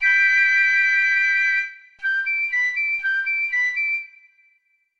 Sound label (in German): akkorde gebrochen